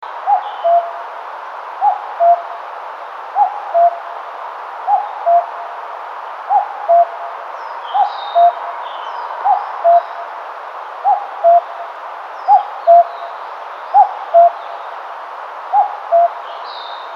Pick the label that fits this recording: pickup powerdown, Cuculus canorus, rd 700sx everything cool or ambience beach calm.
Cuculus canorus